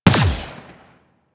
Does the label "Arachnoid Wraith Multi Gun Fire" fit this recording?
Yes